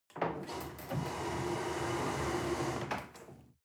table slide on floor

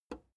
plastic button small simple release

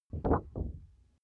paper wobble double

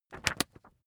magazine skim

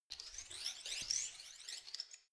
organism settle short

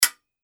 fencing sabre

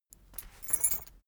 key ring small trousers pocket put

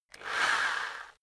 rock drill rev reverse short

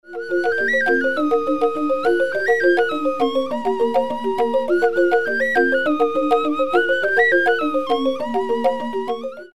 flute percussion in bali

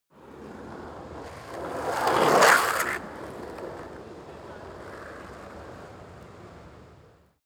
skateboard single drive turn

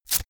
velcro large open fast

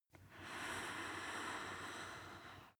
breath female inhale

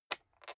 rotary control single turn creaky

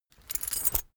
key ring mid size pocket put

